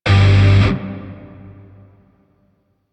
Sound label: Shawzin Phrygian Chord G; Grineer; DE; Warframe